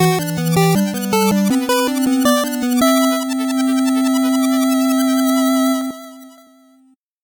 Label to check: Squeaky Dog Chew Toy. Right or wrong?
Wrong